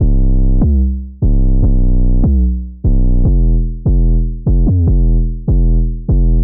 Hip Hop Rap

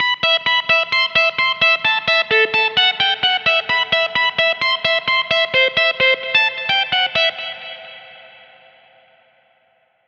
Melody Pluck Rock; midi wizard 2; Unison

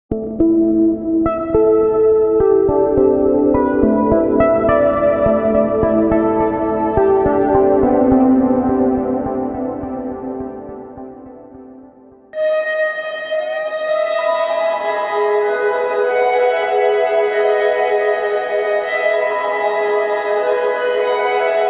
Melodies Ambient Downtempo

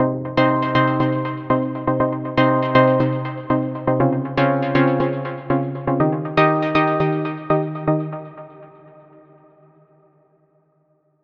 Chords Rhythm Techno; midi wizard

